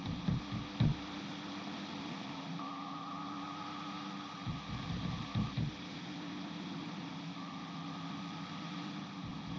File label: Recorder; miside demo windows gamerip 2023